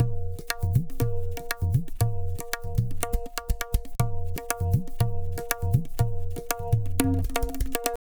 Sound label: tabla, 120 bpm; Zero-G; Pure Tabla